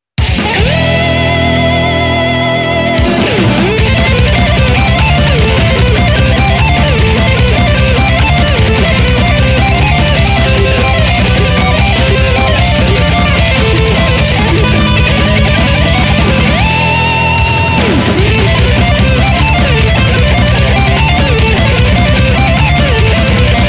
Guitar Solo Neo Clasical

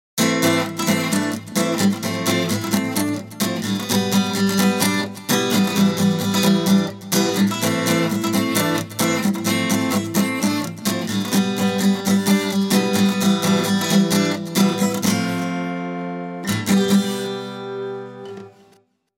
Ac GTR

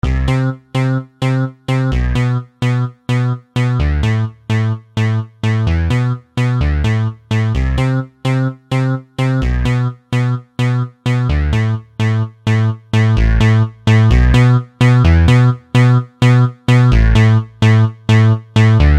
Bass

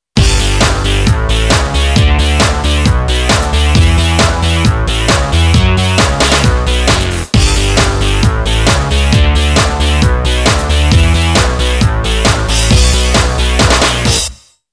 Dr Bass

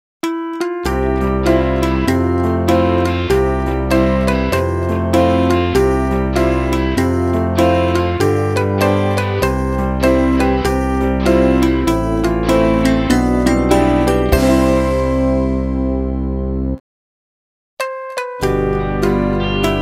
chime

